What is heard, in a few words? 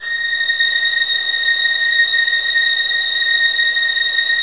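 2nd violins sustain a#6; Sonatina Symphonic Orchestra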